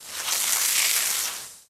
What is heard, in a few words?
Water Hose Spraying A Tree, Soft Start